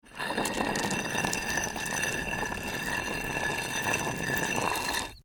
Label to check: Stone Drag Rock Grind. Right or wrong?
Right